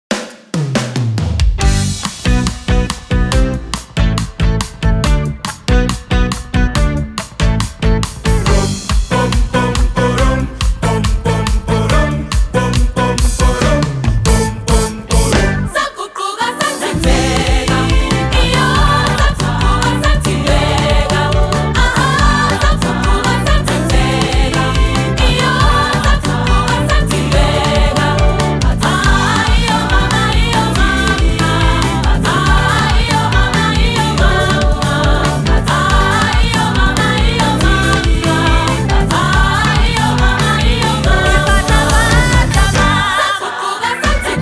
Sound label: Youth Choir